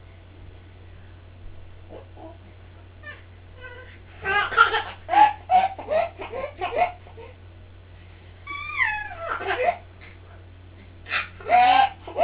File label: belly laughing